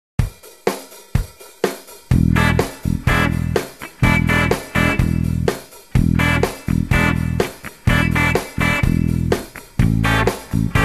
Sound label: Rhythm Guitar